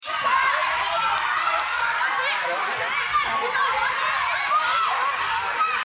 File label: vocals